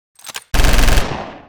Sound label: machine gun; Weapon